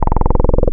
Filter FM, wavetables; Filter FMX; Animoog

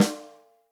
rock snare chromatic, real drums; Best Service ProSamples vol.43 - Real Drum Kits

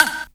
AHH; Q Up Arts; Dream Experience

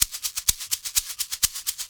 Percussion Loops, Shaker & Tamb; Houseworx

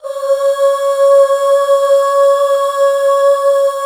UUHH C#5, CH BACK; Best Service Gigapack 1

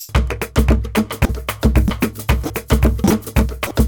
Tamb; Soundscan vol.56; Modern Percussion Loops; AKAI

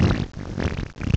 MUD; CMI Sound Library Rev 1.3

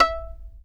fiddle pizz E5 L; Sampleheads; Whole Lotta Country 1 & 2

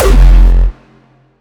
fat little kicky

